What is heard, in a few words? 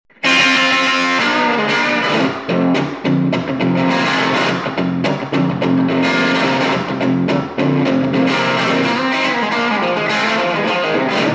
Room Tele med gain; Room Nocastor Tele Medium Gain; Tweed